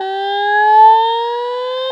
Glissando Sans Attenuation

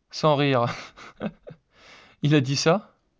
rire naturel

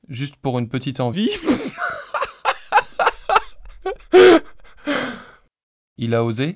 rire synthese contextuelle

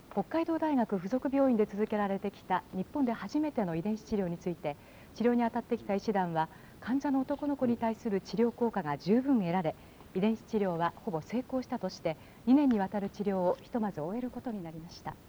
melodie

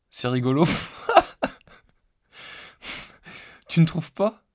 rire naturel

